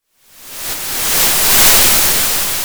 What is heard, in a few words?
Sound of waves crashing on a shore